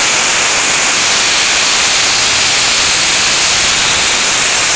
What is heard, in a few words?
fire hydrant spray; faucet; Max Payne